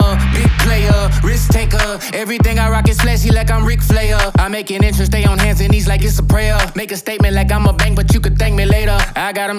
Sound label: Rap Before